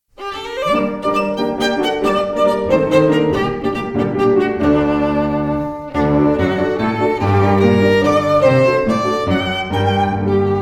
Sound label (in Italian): tenore